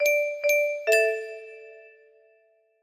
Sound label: music box melody